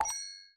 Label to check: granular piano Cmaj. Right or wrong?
Wrong